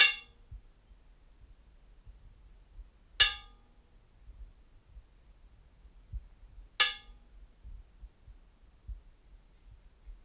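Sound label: 3 hits iron bars in courtyard rec in cell B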